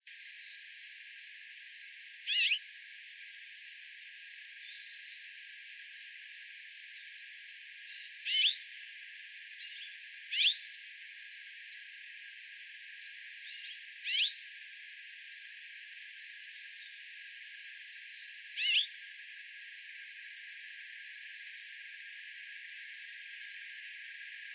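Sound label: Common Rosefinch; akamashiko; baikal; koe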